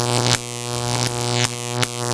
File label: BARRIERE WARNING